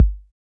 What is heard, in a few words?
808 drum; Mistergenerator